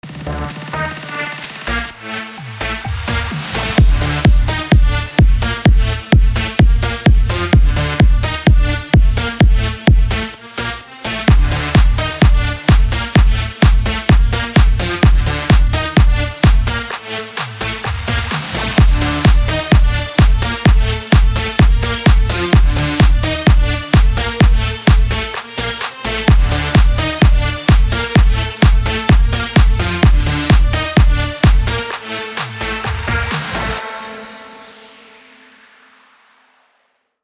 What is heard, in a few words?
BED BIG ROOM D MIN 128BPM